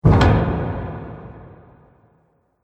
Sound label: Haunted House Door Closed; Creepy Door Sound Effects